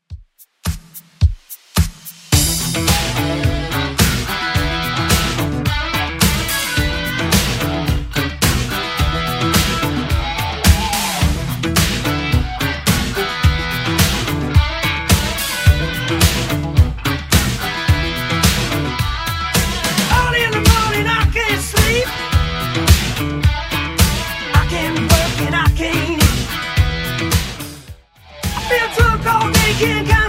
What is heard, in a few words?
80s 108bpm; Redrum